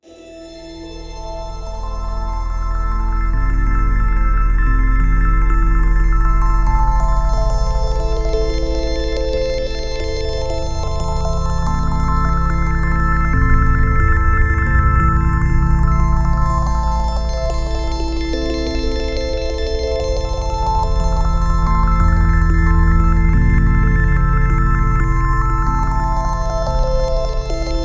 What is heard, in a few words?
Drum notes wet